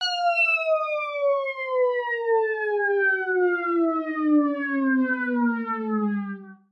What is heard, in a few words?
fall